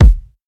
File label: dope kick single hit e key, bass drum tuned to the E note; kick drum samples